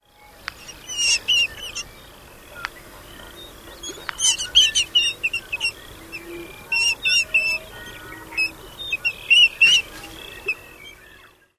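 bill snaps and squeals distraction display, Short-eared Owl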